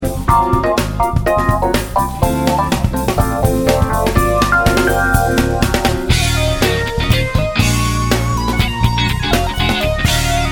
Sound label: cello